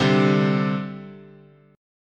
C Suspended 2nd, guitar chord

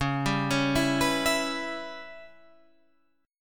Db Minor 7th Flat 5th Db Guitar Standard; arpeggiated; 9,10,11,9,12,12

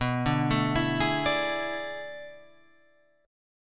B Minor 13th B down Guitar Open F; Bm13 arpeggiated; JGuitar

